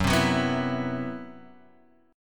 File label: C Major 7th Flat 5th Gb, chord